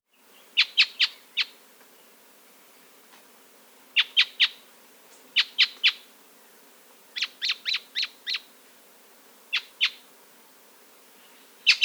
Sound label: Call and songs, Birds of the Costa Rican Cloud Forest